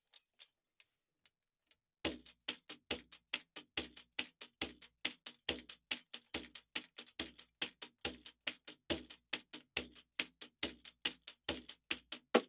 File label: Pandeiro Mid; Yasu Mix